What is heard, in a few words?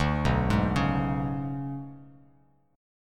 B Minor 6th Add 9th B down Bass Standard, Bm6add9 chord; Bm6add9 arpeggiated; JGuitar